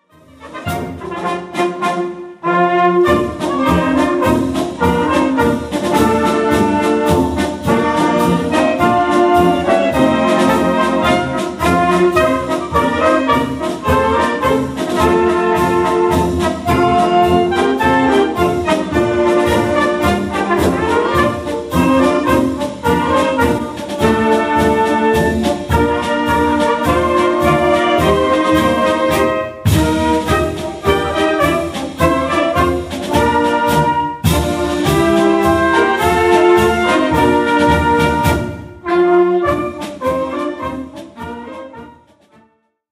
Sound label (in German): marsch